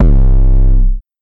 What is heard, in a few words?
808 hard